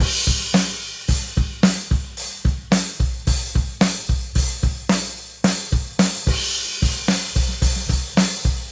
110 Live Drums

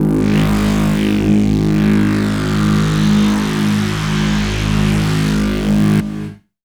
Mutated Bass

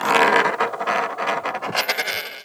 fx one shot coin

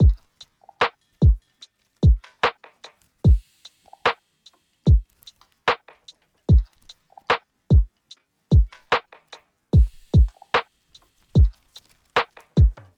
Drumloop, 74bpm, Abm

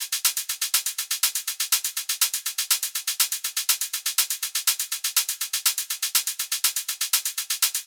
122bpm Shakers; Melodeep Kit 5

